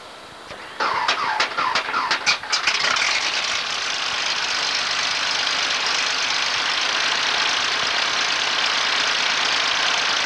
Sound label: engine start